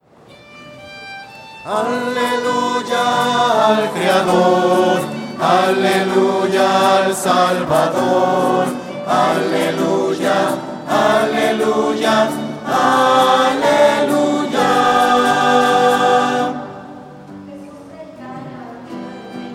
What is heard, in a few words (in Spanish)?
aleluya al creador voces